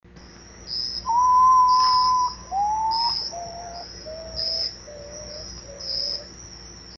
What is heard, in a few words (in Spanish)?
urutau, aves del chaco; cantos